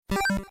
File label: getting scraps softer